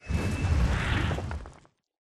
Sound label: atlas dash slide; Titanfall 2